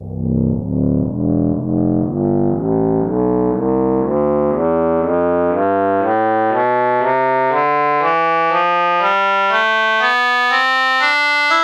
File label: shenai tonleiter